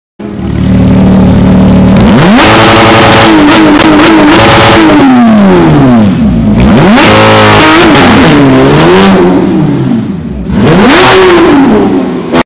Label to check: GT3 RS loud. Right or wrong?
Right